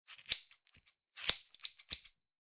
Cutting hair scissors snapping

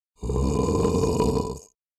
Male burping low deep groan

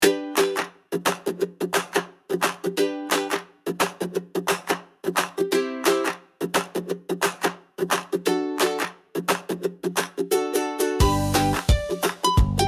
Upbeat playful color your steps

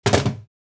Cartoon falling body impact

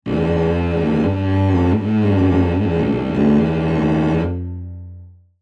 Cello awful play string scratch; gfxsounds

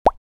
Cartoon funny pop

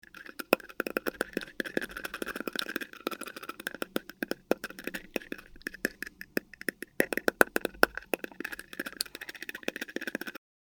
Teeth chattering freezing cold

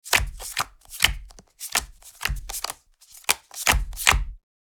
Board game dealing cards; leisure

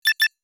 Computer beeps digital menu confirm; sci-fi

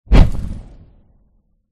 Fireball cast hit

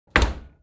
Kitchen fridge door open

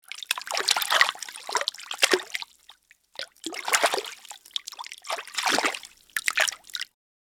Water movement in bathtub

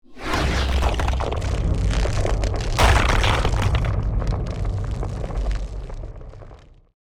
Nature magic spell earth splitter

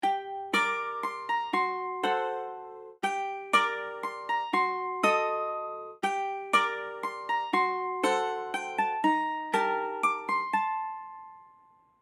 Medieval bard mandolin eerie melody loop

